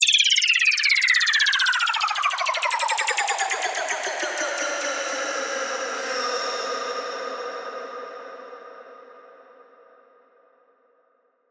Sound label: Downlifter